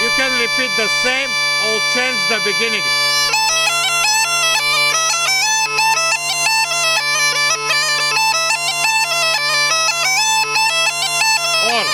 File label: Nestinarsko Ruchenitsa, Small Gajda